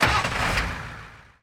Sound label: vehicle semitruck ignition